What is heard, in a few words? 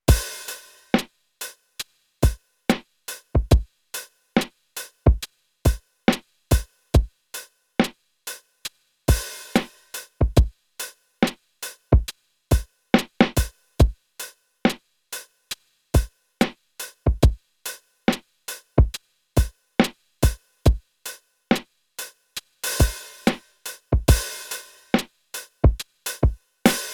lofi drum lofi